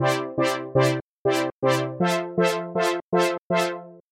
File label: synth filter; synth1